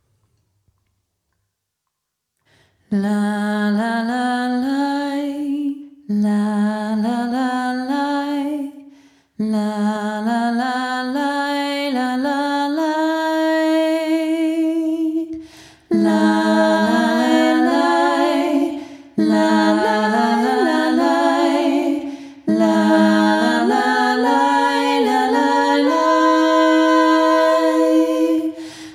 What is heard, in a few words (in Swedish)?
Vaggvisa Rysk alla